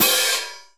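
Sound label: CHOKED, CONCERT CYMBAL; Northstar - New Gold VOL-2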